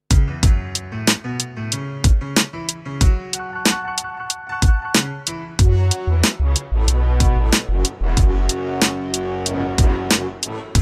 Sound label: Instrument